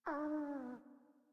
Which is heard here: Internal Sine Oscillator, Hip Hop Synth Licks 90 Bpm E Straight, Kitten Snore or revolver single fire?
Kitten Snore